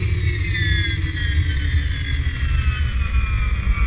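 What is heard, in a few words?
engine decelerate bwing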